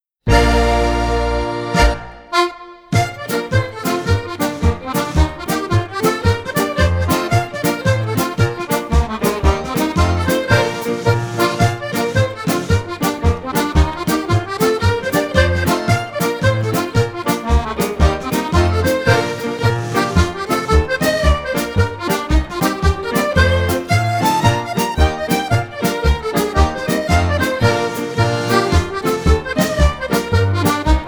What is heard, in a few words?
music sample jig